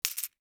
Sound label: coin drop